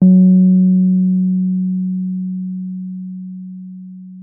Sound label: WURLITZER F#3; Xsample VOL-1; WURLITZER B